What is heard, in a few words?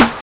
spit, snares real